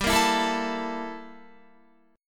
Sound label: G Minor 6th Add 9th G down Guitar